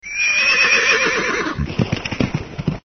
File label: Snigger And A Running Horse